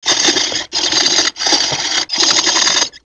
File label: pulley